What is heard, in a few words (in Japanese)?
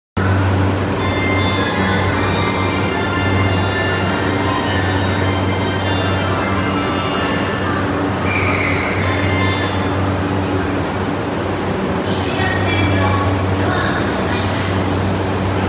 JR総武線快速東京西